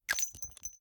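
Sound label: charge reload shell eject, pistol; gmod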